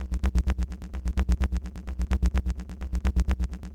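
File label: Blip bass clicky B minor; loops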